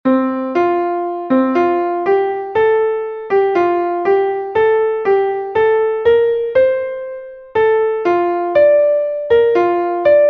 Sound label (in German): klavier; der herbststurm braust durch wald und feld; melodiemeister